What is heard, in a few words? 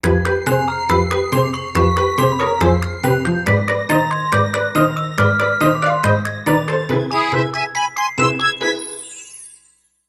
Jingle - Gothic